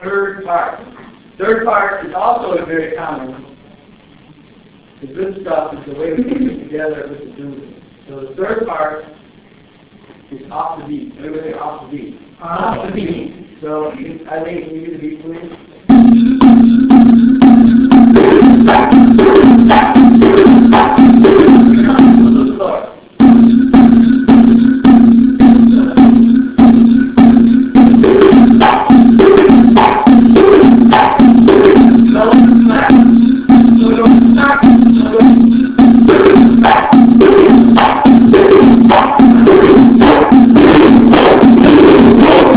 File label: accompaniment; third